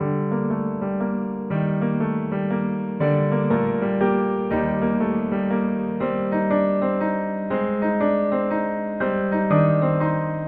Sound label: Piano Loop